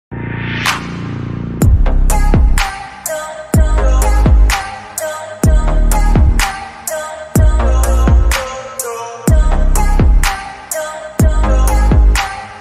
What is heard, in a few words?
instrumental ringtone bgm